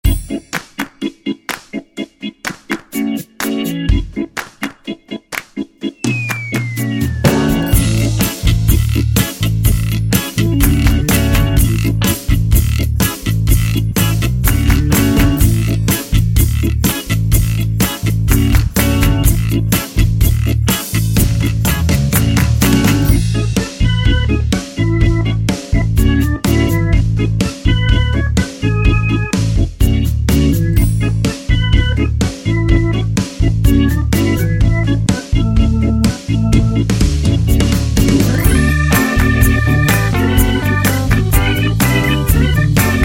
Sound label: ORGAN GRINDER